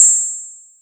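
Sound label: Boss DR-202 percussion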